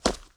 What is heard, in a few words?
Foot Man Hall; Foot Man Hall Wet; gamerip